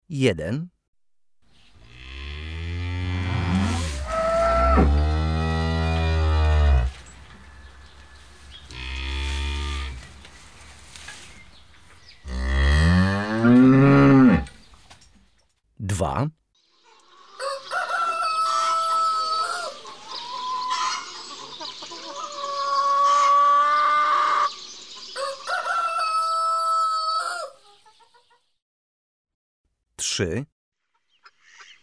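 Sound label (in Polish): krowa kogut kury kaczki owce indyk, głosy; karty aktywnosci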